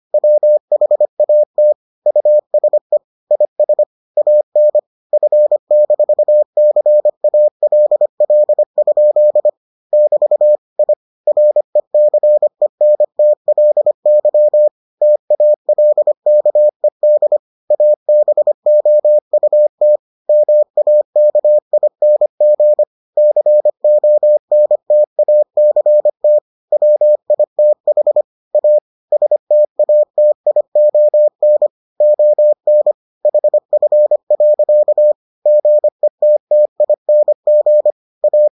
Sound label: morse